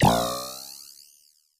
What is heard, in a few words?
synth boing; boings